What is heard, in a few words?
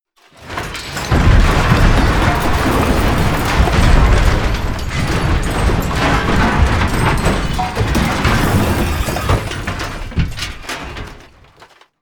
metal loud medium